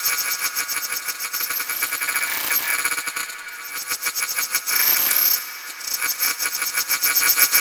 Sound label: Ambient Tech Drone; Props; Warframe; Tau; Ambience